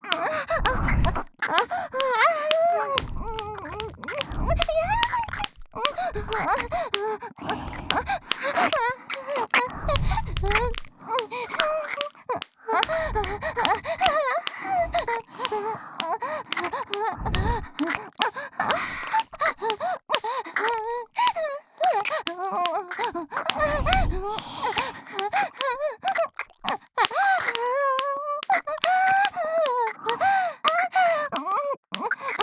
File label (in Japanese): 本番喘ぎ + 両耳キス; エミィ; ソフィ